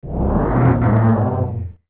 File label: Seesaw Up